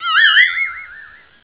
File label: hypno circle; Bounce Touch